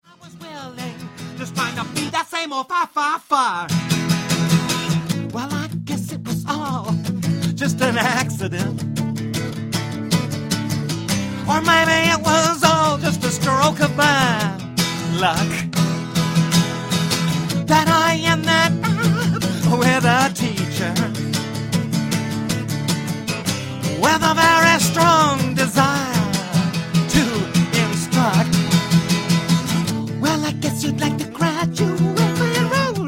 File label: SOLO